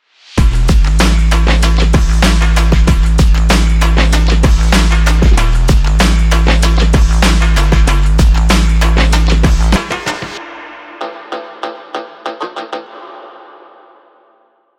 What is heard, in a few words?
urban speed